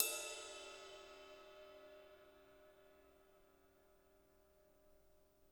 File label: RIDE, RIDE CYMBALS; Spectrasonics - Backbeat